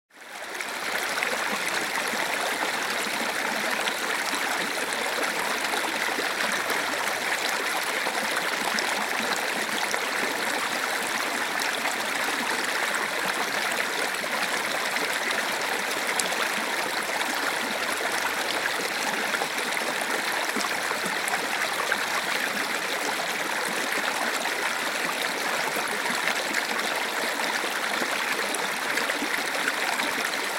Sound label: mountain stream, Spain; Nigel Tucker